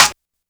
SMAK; E-MU Formula 4000 Series Vol. 1; Hip Hop Nation; Hip Hop Kit 2

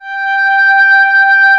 PA, ANA PAD

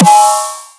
SNARE

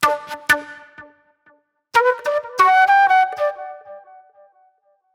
Sharp Delay Flute

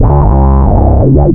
WAHWAH BASS; Big Beat Samples & More

